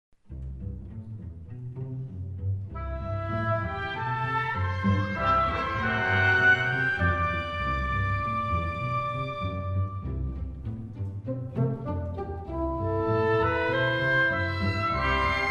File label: Frolicking; Nemo; Little Nemo Ballet Tableau 2